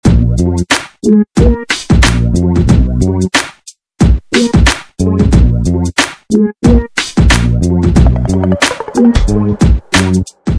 91bpm

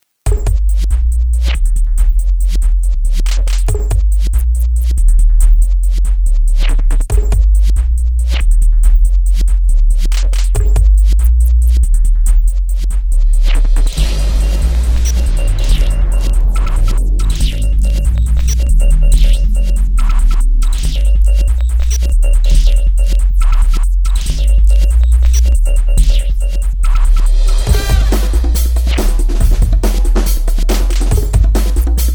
Blipz

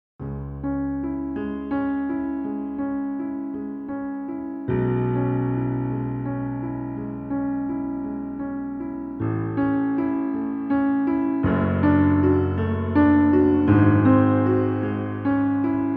BOSENDORFER 290 moonlight

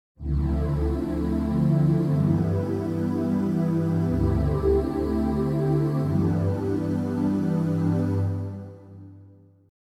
synth Voice

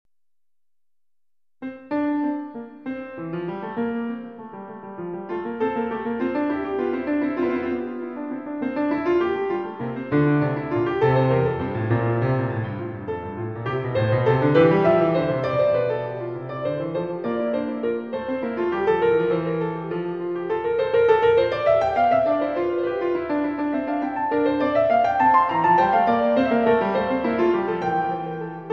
BOSENDORFER 290 Fugue

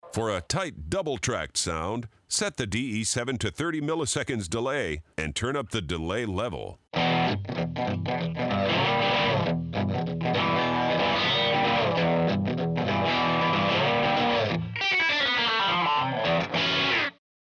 Double Track

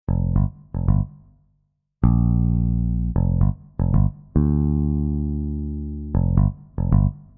jazz bass pick comp

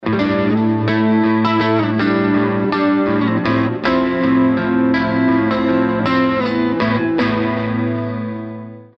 clean room

